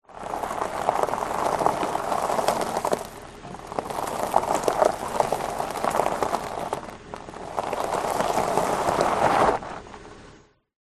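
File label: vehicles car hyundai trajet roll engine on short bursts dirt road track external; 2006; wheels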